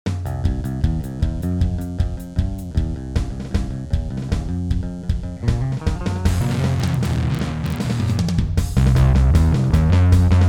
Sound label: synth, analog filter guitar pedal